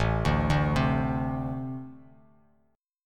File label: A-Minor Double Flat 5th A Bass Standard; arpeggiated; JGuitar